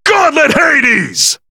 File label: Rising Kick; BBTAG